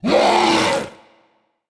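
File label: orc voice die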